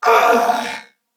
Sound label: Pain, Male; Corpus Venus; Warframe; CorpusVenusMaleA